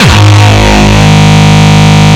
Longkick, kicks

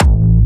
Jump Kicks, Bassdrums

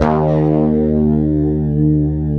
E 2 STRAT VB, HYPED STRAT, Guitars; Spectrasonics; Hans Zimmer Guitars VOL-1; 90's Sample CDs

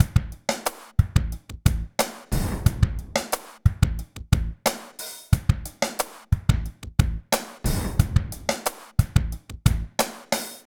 90 slow, loops, hyper dance; Best Service

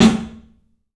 club snare, 3D Drums; 3D Sound

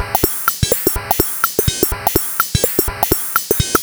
125bpm, House Drumloops

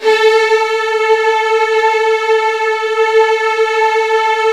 Strings A2 L; Virtuoso

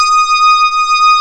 HARD RHODS D#5, D50 RHODES; East West; Global Sounds

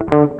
FUNKY, Grooves

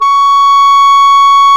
Clarinets; Roland L-CDX-03 Disk 1; Clar Cls

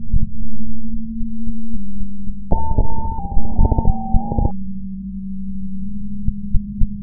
loop, techno atmos

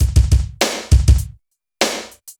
Ambience, BPM100; Ambience Loop; AKAI S6000 CD-ROM - Volume 4

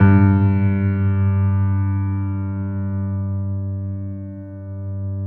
PED MF, GRAND PED

